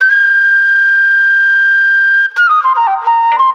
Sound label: flute shriller, Phonky loops